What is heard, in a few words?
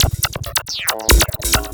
percussion loops 138, Techno; PS-45